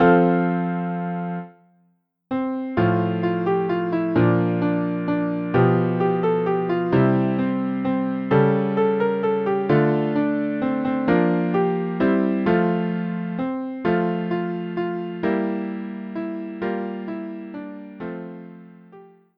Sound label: We Wish You a Merry Christmas Piano Solo; easy beginner; arranged for solo piano